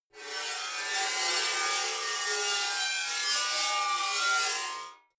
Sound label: metal scrape rich straight